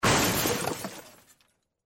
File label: crash, vehicles